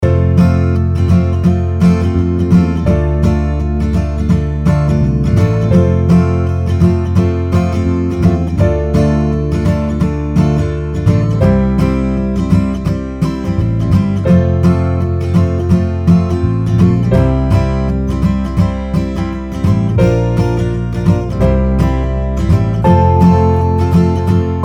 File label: happy lazy strumming